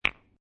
Billiard Pool Snooker Two Pool Balls Clacking Together Slight Rattle; Billiard,Pool,Snooker - Two pool balls clacking together with slight rattle